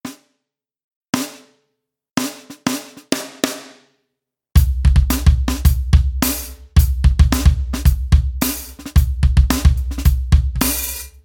Piccolo Snare

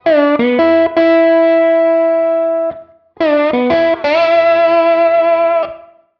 Vibrato

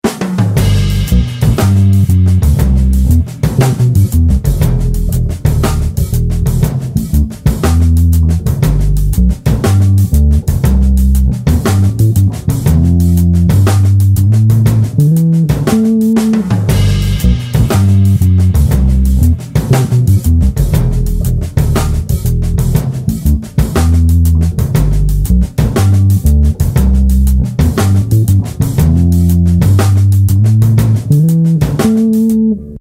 Long notes

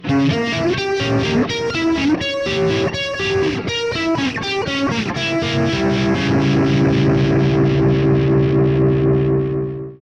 Diminished

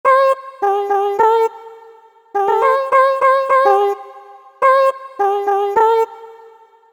Vocal Chop

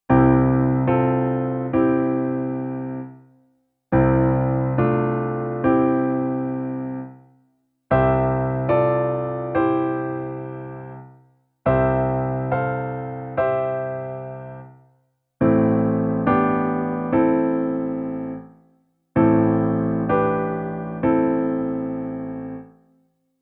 Scale tone passing chords

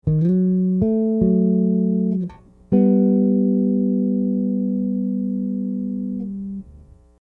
F to A m3, bass, chords and double stops; chords and double stops on bass

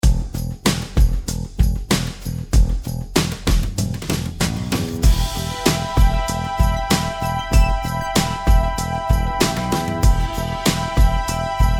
JAM BASS DRUMS; EMI

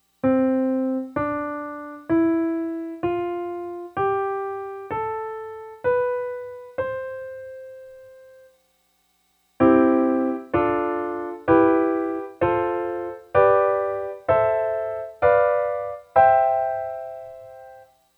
Major Scale Triads

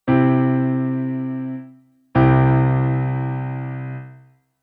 Triad with bass

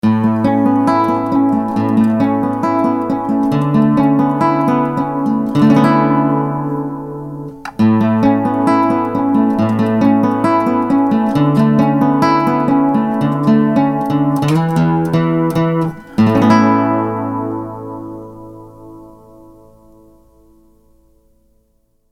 ACOUSTIC CG TA FX

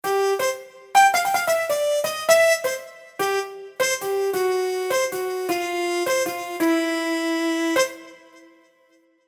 Piano n Mute

